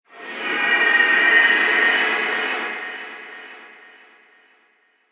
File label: Subtle Sorcery, magic sound effect; Fantasy Sound Effect; Andrew Fleming